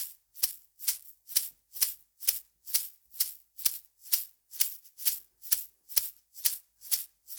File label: 130bpm Small Medicine Bottle Shaker